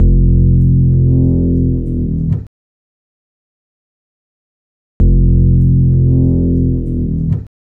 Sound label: Acid Mod Bass-F, lounge, 096; Zero-G Kocktail Kollection; Construction Kitz